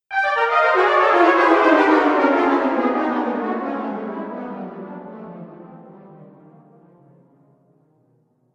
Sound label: Cartoon Failure Brass Delay; Cartoon Music Sound Effects